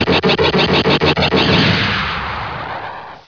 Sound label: shoot